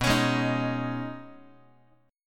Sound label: B 6th Add 9th B down Guitar 4A 4E 5A 5D 5G sharp 6C sharp; strummed